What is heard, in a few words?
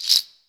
PALMSTONE; East West; Global Sounds